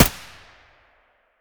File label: aps muzzle distant; STALKER; IWP